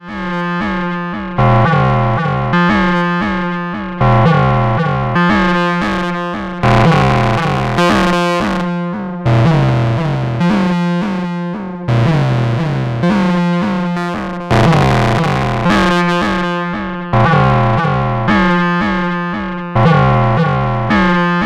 Korg volca modular abxc

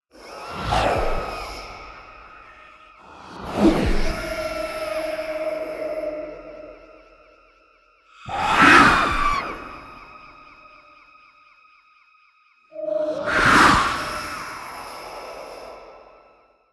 Creatures

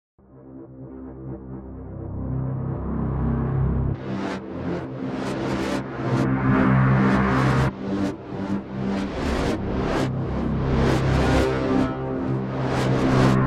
Brass Loop Designer Straight Rev All Low

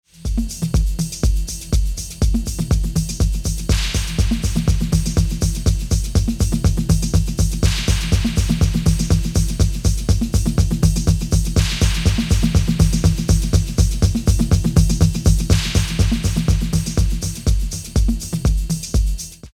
Tap Digital

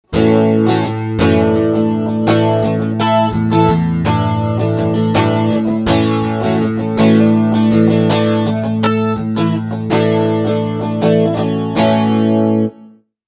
strumming

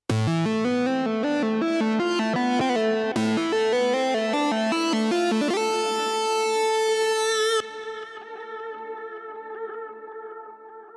Arturia V Collection Mini V4 synth lead; Mini Hammer

